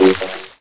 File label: gurp; openffa; droid